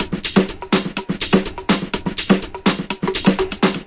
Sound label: brazil house nokick, drum loops and breaks